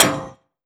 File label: frag physics bounce metal hard; gmod; viper